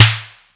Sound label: 808 Snare Vinyl; Slate; Vintage Drum Machines Volume 2 808 Edition